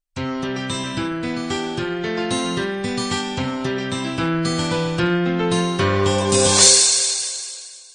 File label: celtic ballad intro